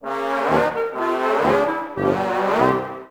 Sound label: Loss